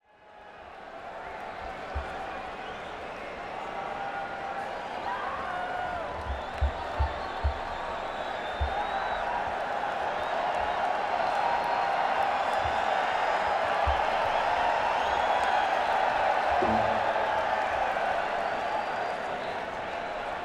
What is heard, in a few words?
Crowd, Madison Square Garden; New York, NY